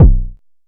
analog quick, Kickbank; Ableton Foundation FreePacks

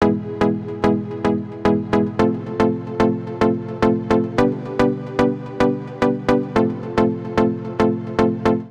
House Pluck (E) 120bpm; Music Loops; Mouse; Ableton; Singularities

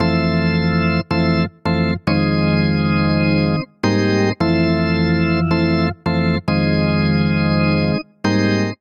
Progression House Organ FMaj7 - Fmin9 109 bpm; Electric Keyboards; Ableton; Factory Packs

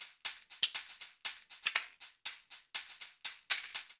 Caxixi; Latin Percussion; Instrument Kits; Ableton; Factory Packs

